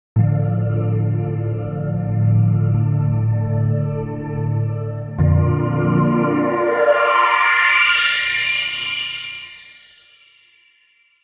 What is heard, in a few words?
deep ocean